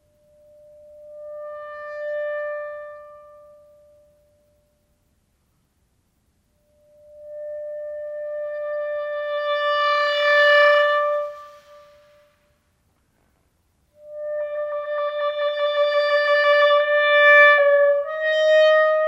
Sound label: Clarinet